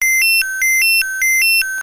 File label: Nextel Ringer